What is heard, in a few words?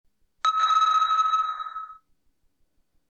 not sonar, blackberry bold 9700